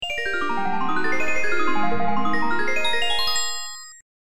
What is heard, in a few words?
Unknown Jingle